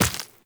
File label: Step Flesh, Footsteps, Walk; Warframe; Tenno